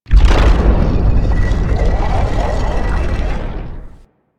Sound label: Centerpiece Open, Ambience